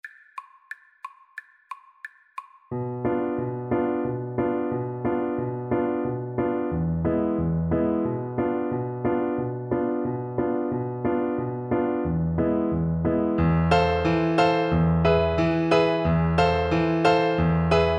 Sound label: Abdala Recorder